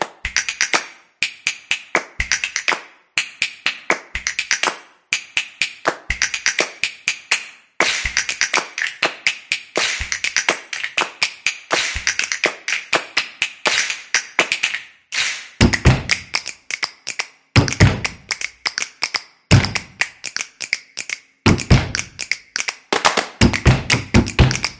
Snaps; percussive nation vol 2

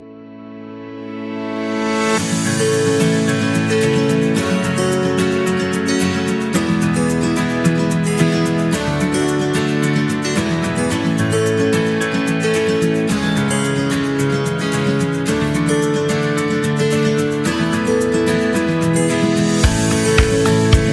Happy; cooking show

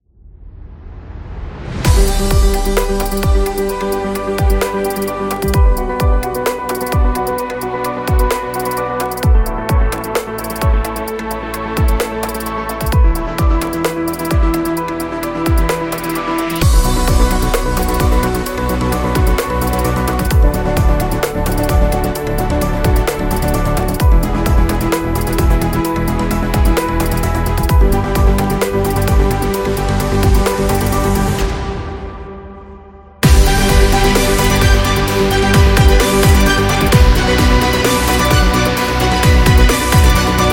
Epic Action; epic music vol 3

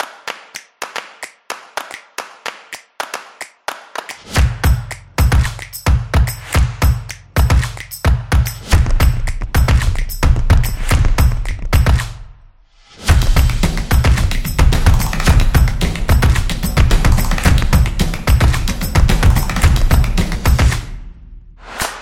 Agency Promo; drums trailer

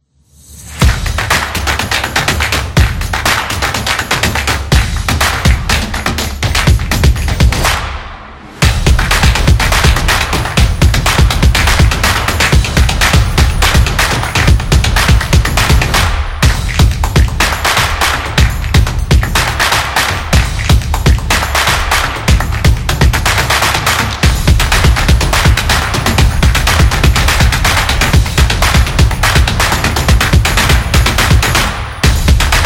Upbeat Drums Percussion

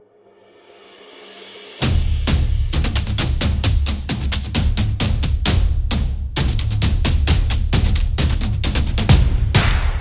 Action Drum

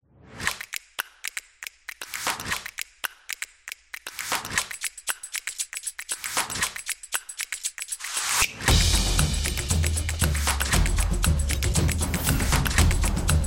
Drum Intro; casual game

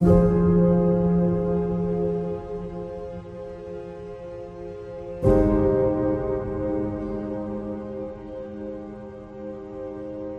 Soothing

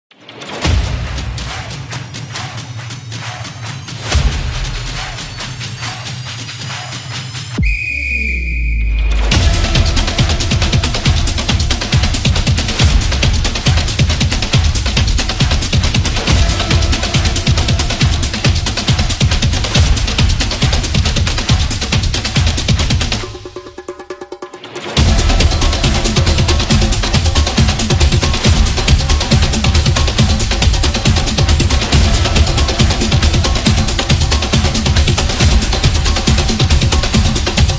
Trailer Sport

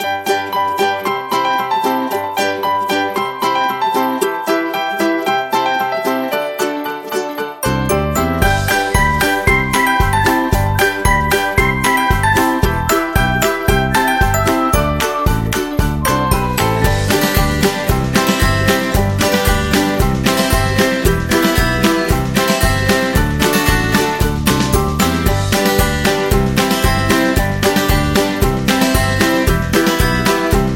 Happiness; birthday music

